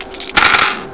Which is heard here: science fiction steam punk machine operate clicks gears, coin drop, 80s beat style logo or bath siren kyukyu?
coin drop